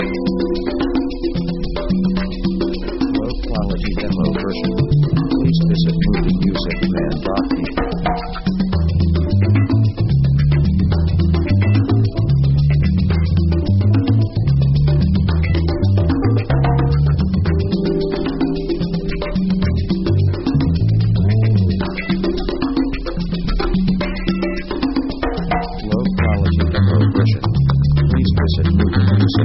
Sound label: 70s score funk; Action themes